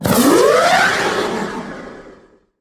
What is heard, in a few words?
Animal Conservation Drone Exit